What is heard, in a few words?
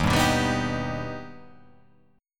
D sharp Augmented D sharp, chord